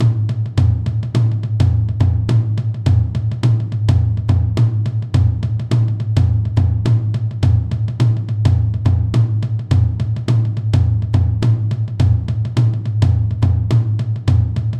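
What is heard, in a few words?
music jungle rhythm bpm105 double loop; Donkey Kong Country Returns HD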